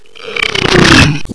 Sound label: burp